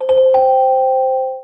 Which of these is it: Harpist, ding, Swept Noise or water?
ding